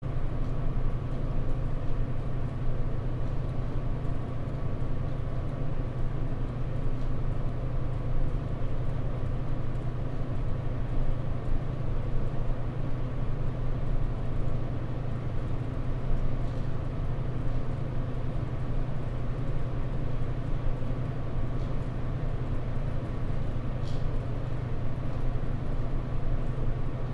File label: Room Ambience Vendi